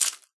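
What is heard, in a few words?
rattlebone, percussion; ILIO; Synclavier Percussion 2; World & Orchestral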